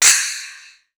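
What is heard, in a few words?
splash, cymbal; East West; Global Sounds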